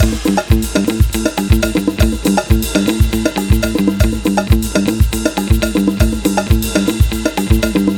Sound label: Music Round Anticipation Loop; Stikbold! Dodgeball Adventure Deluxe